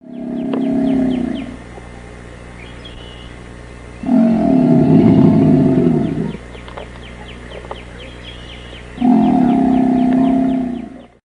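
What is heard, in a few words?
fem bellow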